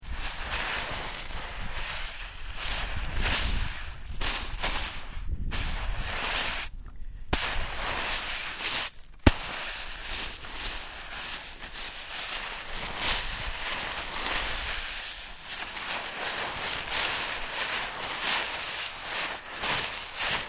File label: SKIING TURNING JUMPING MODERATE, Snow Skiing; Skiing and Snowboarding (Sound Effects:Sports)